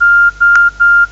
Low Battery

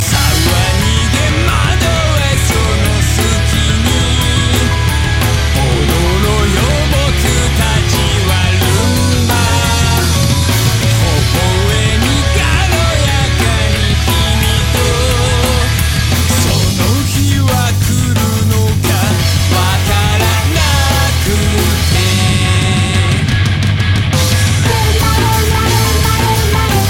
Rumba Tone

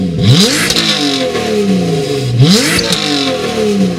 Car Sounds

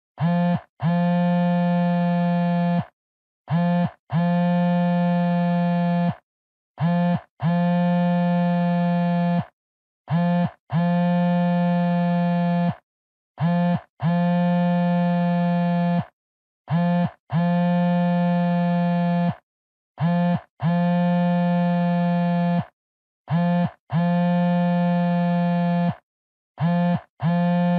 buzz; Dokkaebi

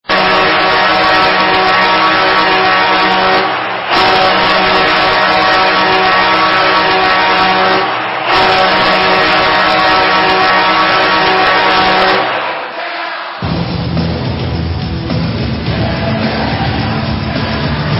Goal Horn; Red Wings

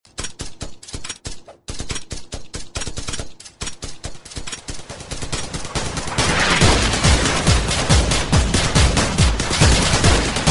Gun Shot Rap

